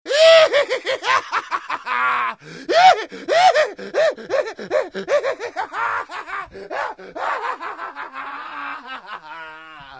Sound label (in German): Fieses Lachen